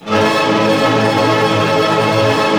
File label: ARP D# MAJ FF, STR ARP; Sound On Sound; Peter Siedleczek's Orchestra